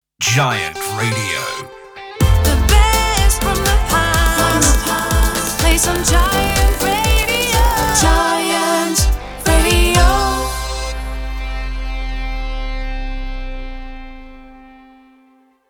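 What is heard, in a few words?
Sung Jingle 124bpm G major Slogan; Giant Radio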